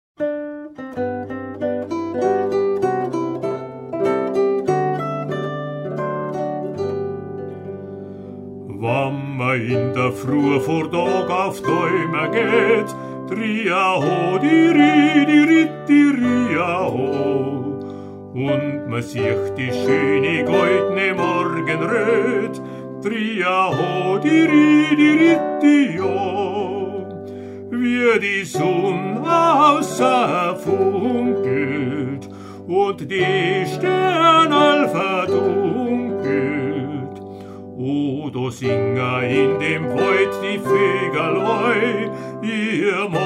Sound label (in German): Gebirgslieder; Halbreiter